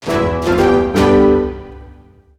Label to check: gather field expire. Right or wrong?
Wrong